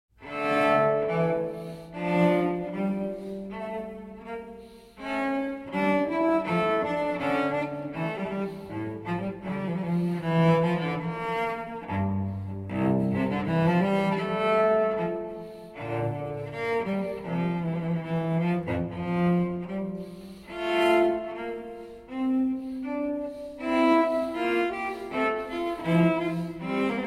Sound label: Adagio, Sonata for cello solo in fa magg; BWV1033; CPE Bach